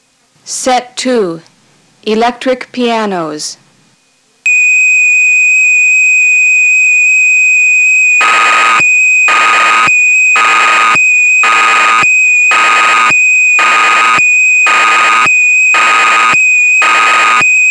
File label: Electric Pianos; dx9